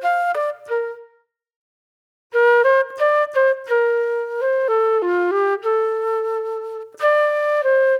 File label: Reflective Flute